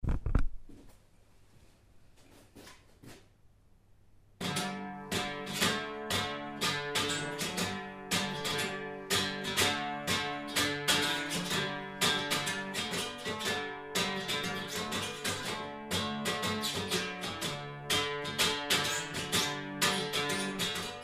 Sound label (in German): scheitholz